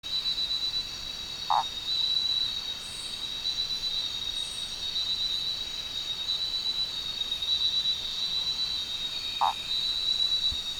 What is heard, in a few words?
Leptobrachium hendricksoni